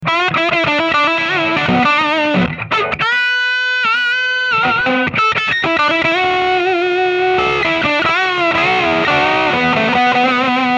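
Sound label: high gain, amps; Raw channel cascaded into Normal channel; gypsy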